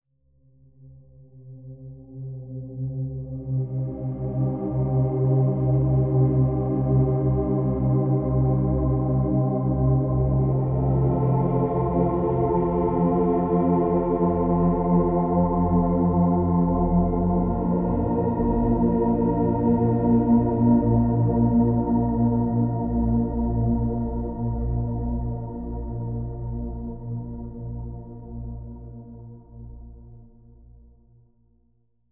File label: binaural sample alpha waves 12hz